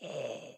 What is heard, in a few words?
zombie idle